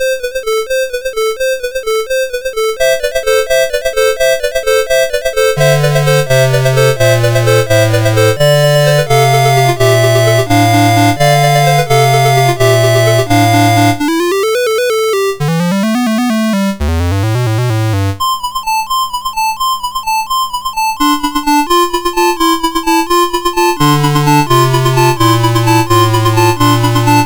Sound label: Christmas Chimes, MOS6581; MOS6581R2